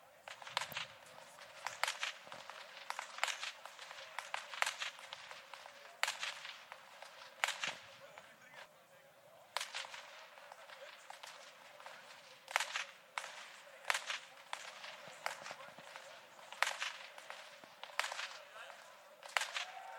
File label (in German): schnalzen, jugend; laufen; waging